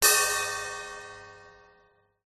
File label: musical cymbal crash tap light thin stick; zapsplat